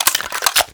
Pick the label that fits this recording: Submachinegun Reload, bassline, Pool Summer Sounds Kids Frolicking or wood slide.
Submachinegun Reload